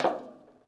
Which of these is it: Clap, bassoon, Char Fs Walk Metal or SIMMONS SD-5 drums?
Char Fs Walk Metal